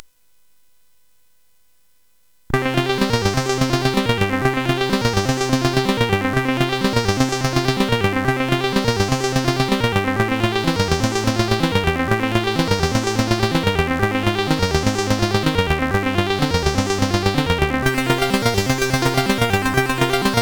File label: Intro music, CSG8580R5; Rockmonitor 5; hvsc; MC